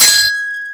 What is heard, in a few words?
SW HIT, sword hits; Total Control - Action Cutz